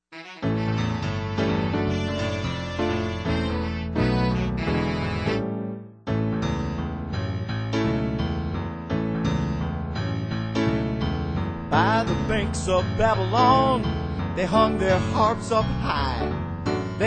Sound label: Harp